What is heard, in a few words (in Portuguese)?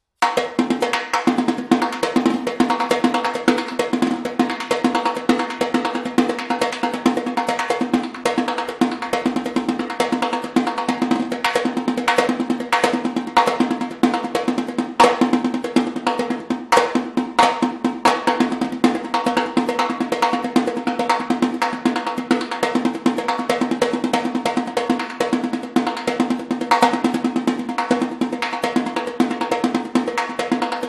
repinique; ivsom; charuto